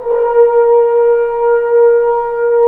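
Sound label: french horns, ambient; Roland L-CDX-03 Disk 2; F.Horns 1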